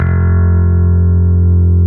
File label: bass